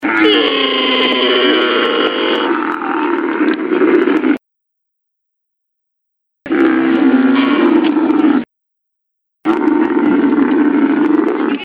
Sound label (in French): dromadaire cri, cris d animaux